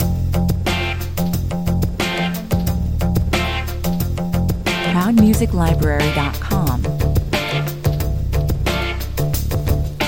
sirens (talkover) 90 bpm

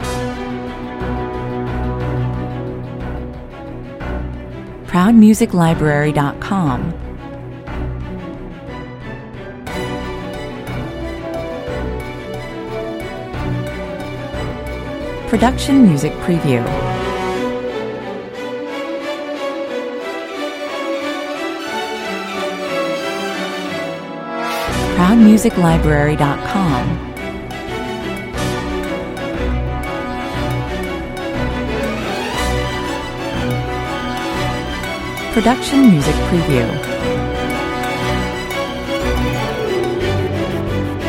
no choir no perc

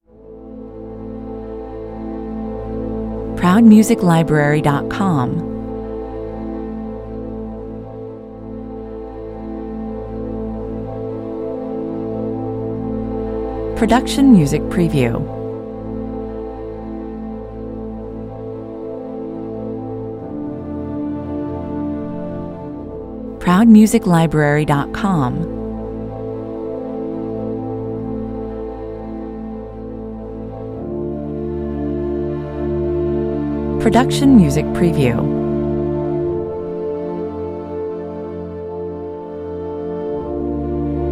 a tense moment strings only

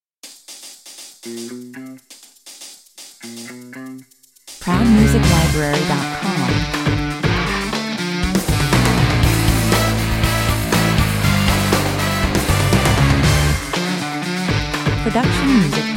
rock 120 bpm

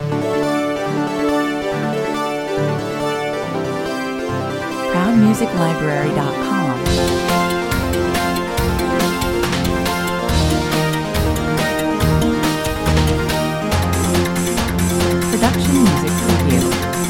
commercial

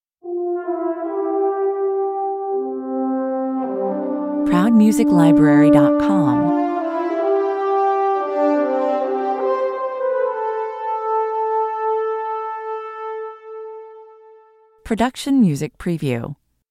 horn theme

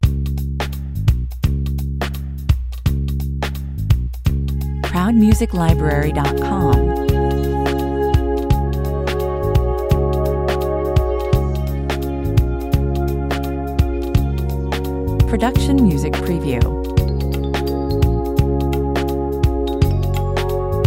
quiet contemplation 85 bpm

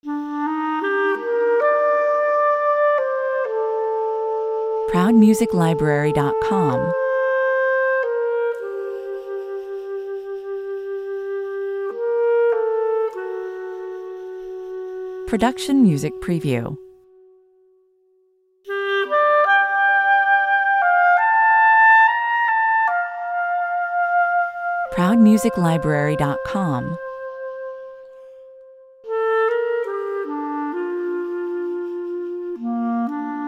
lone clarinet

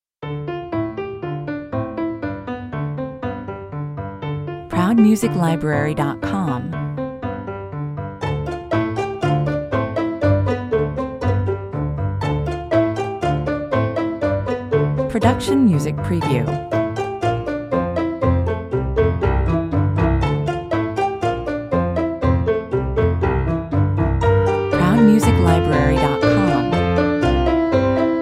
no bird sounds 120 bpm

